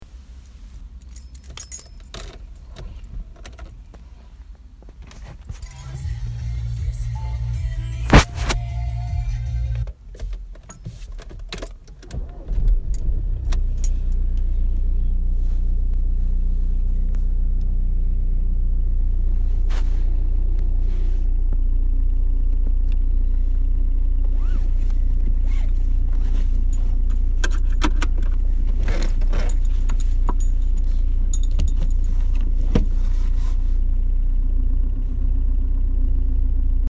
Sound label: Driving